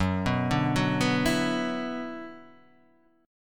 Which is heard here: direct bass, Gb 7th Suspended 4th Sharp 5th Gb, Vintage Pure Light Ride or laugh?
Gb 7th Suspended 4th Sharp 5th Gb